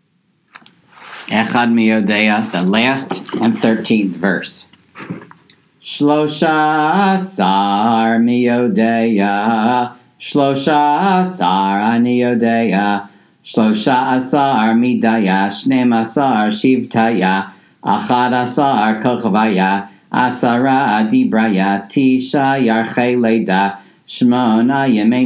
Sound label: Ehad Mi Yodeah last verse; Passover Seder